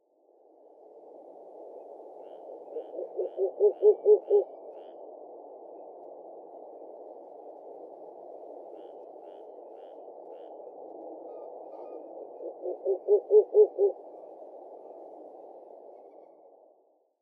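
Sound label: Ural Owl; hukurou; watarase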